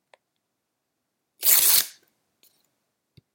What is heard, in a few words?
Auditory Icons; Paper; oviatt